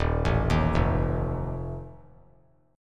D sharp Suspended 2nd Flat 5th, chord; arpeggiated; JGuitar

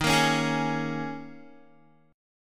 D sharp 7th Suspended 4th, Guitar, chord; D#7sus4 strummed; JGuitar

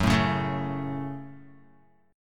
Gb Minor Gb Guitar; strummed; JGuitar

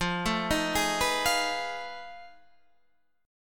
F 7th Sharp 9th Flat 5th F

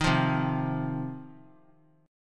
C Minor Double Flat 5th C down 3C 3F 3A 3D; Cmbb5 strummed; JGuitar

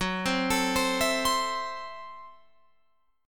Gb Minor 7th Flat 5th, Guitar Open F; arpeggiated

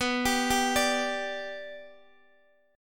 B Minor Sharp 5th, Guitar, DADGAD

